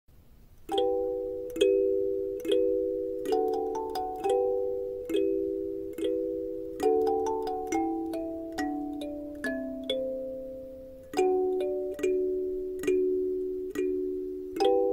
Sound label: kalimba cover; Ezel dizi müziği